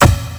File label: Flash; Home Gene Splicing Kit